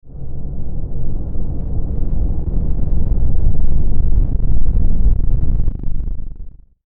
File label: static distort; Static Distortion Hum; Production Element Imaging Element Accent Transition; Blastwave